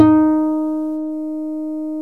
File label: HARP D3